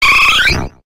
Grito de Eevee en la primera generación

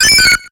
Grito de Wooper

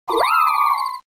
Grito de Leafeon

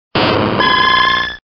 Grito de Octillery

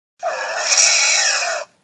Grito de Chesnaught

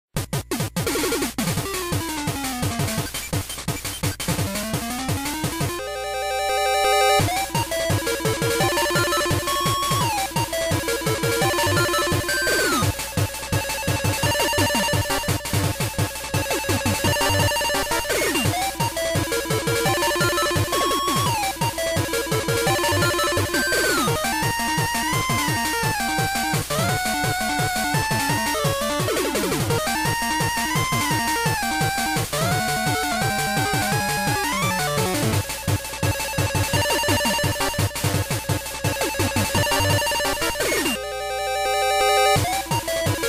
Bonus Mewtwo Pinball

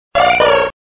Grito de Smoochum

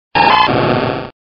Grito de Croconaw

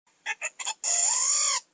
Grito de Heliolisk

